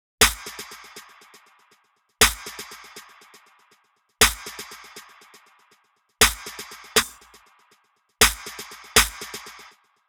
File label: Snare